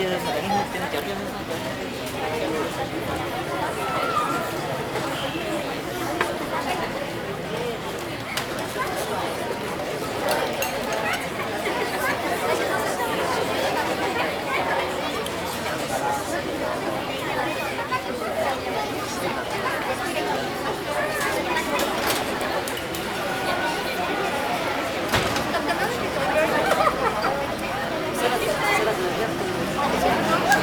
Amb Terrasse Resto; french; Crowds

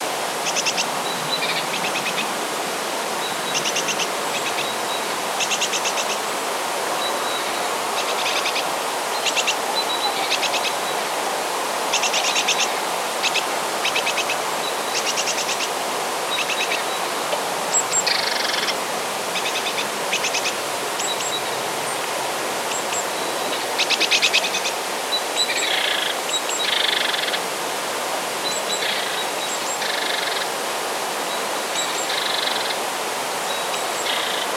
Air matin, Countryside; French

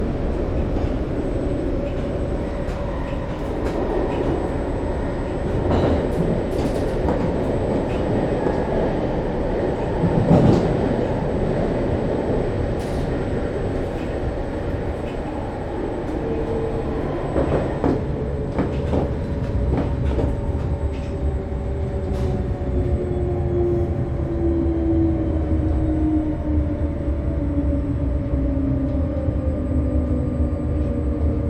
RER arrivée longue, Paris metro; French

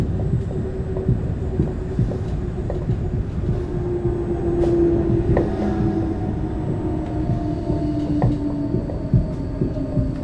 Rer Arrivee Porte, Paris metro; French

